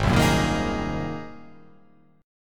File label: Ab 9th Ab down; Ab9 strummed